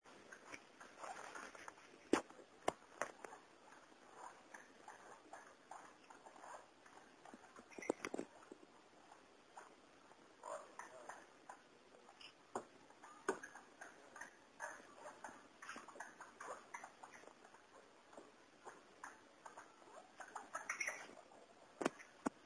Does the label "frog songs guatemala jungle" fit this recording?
Yes